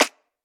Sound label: Claps